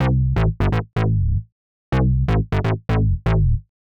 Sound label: Synth Bass A, 125; PowerFX - Nu Jazz House